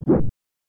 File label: SFX Swipe